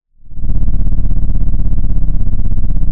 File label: Sound of an engine revving